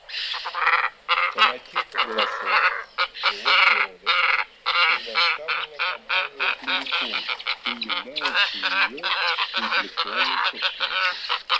Каравайка Mignattaio

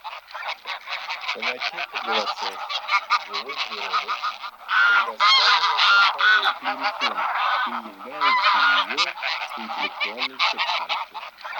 Гусь серый базар Oche Selvatiche

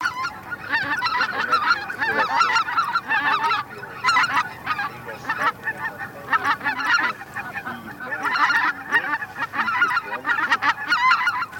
Белолобый базар Oche Lombardelle; plurifon

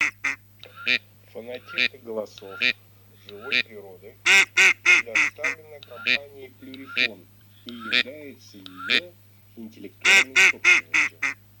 Серая утка Canapiglia

